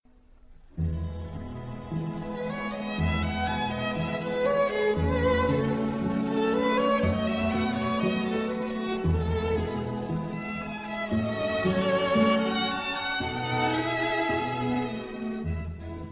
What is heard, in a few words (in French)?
Quintette avec clarinette